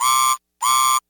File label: percy off whistle twice; Thomas the Tank Engine; Tomy; plarail; talk n action; Percy accessory